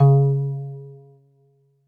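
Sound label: Jazz Harp C#4; Kawaii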